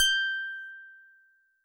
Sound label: Solid Bass DX100 G7